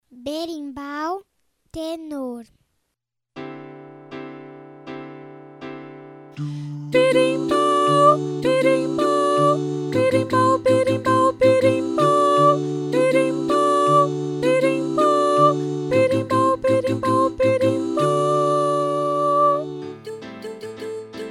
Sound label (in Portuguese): berimbau naipe tenor; 4 VOZES